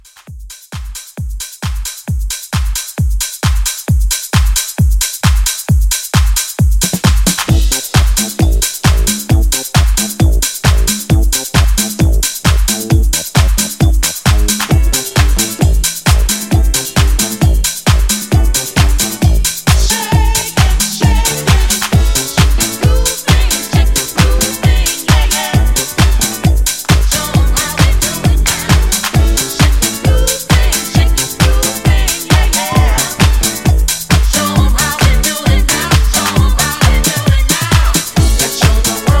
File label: 70s Disco Mixshow Re Drum 133